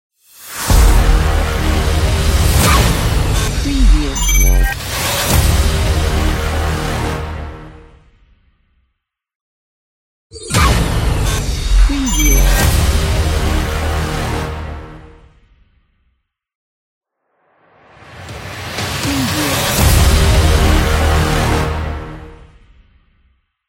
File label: epic logo